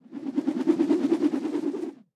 Ambient Flying world launch fan pass by; talking tom gold run

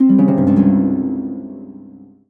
Solo Harp Negative; MUSIC EFFECT; startide

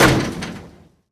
Dunk Rimshaker Real

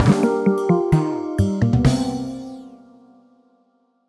Jingle Lose; super ufo fighter

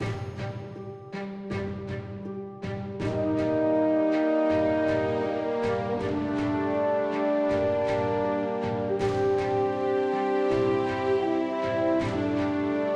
Relic Temple General Music Bed

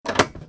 Closet Open; No More Heroes World Ranker; Android

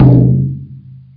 bounce

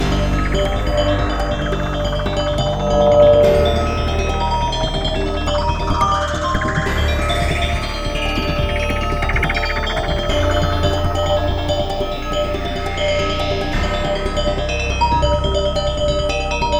Gamelan techno Slow; Rive: Wreck, Hack, Die, Retry; PS4

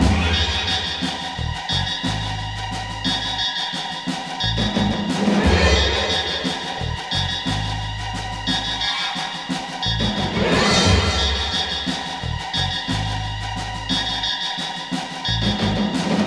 Boss Fight, 177 Bpm Loop; Mini Jack; We Happy Few

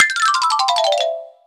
cake release; Birthday Party Bash; Wii; Spanish; cake drop

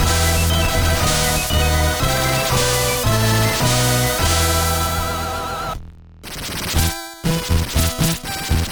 music tournament intro; Skellboy

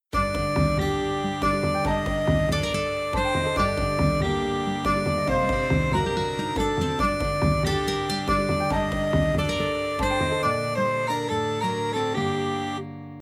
Monotonous notes; Pirates Outlaws

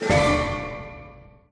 Train Nice; dragon mania legends

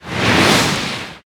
Dirt Finish

